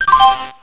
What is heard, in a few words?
pain; quake2; tourney; battlebrowzer